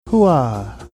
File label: hooaah